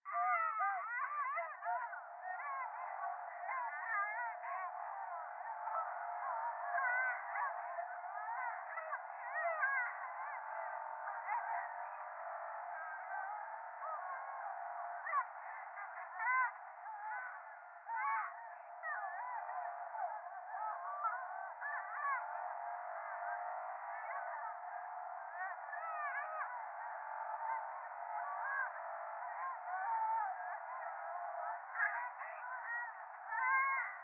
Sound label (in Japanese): 電話 シャブ喘ぎ 車の中